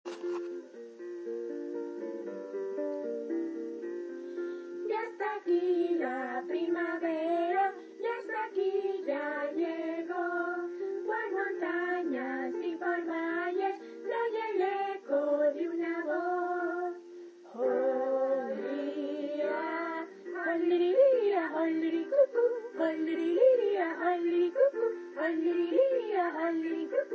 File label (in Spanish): cucu